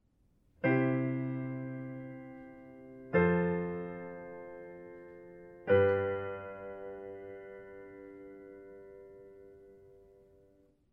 Voices in Contrary Motion

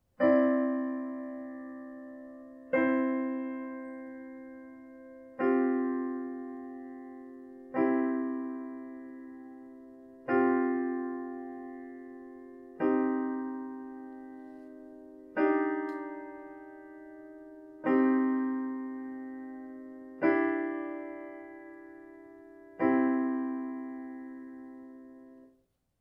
Suspended chords

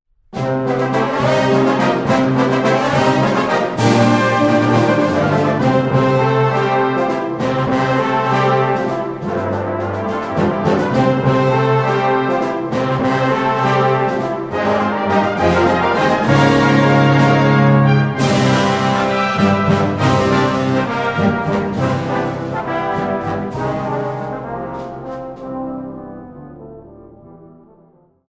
A Christmas Fanfare

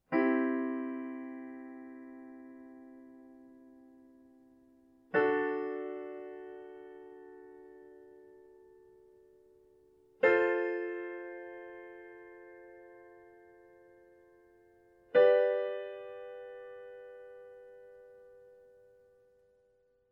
Modulating by Whole Steps

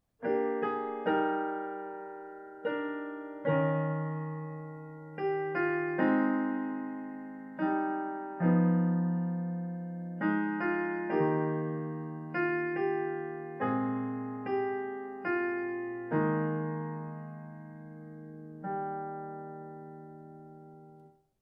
Segue out of prayer

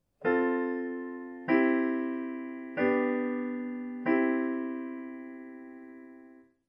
IV V I Cadence

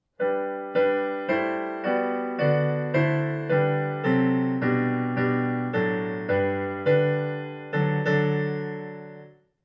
Parallel oblique and contrary motion

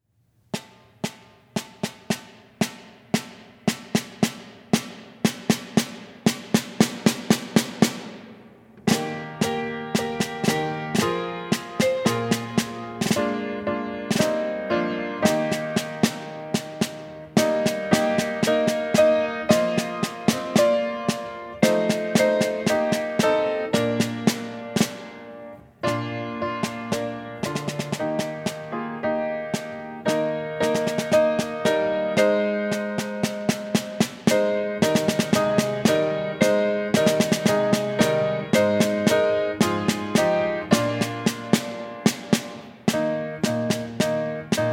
Snare Drum

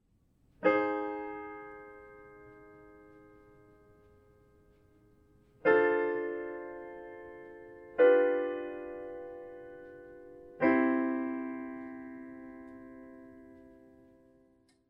Modulating ii V I

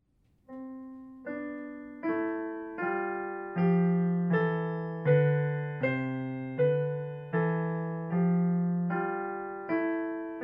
C Scale in contrary motion

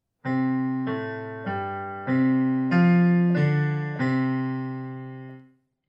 Bass part

